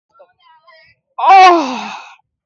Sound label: old man groaning